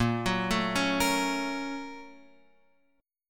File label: Bb Minor Major 7th Sharp 5th, Guitar, Open F; BbmM7#5; arpeggiated; x,5,6,4,4,5; JGuitar